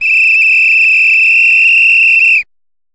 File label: whistle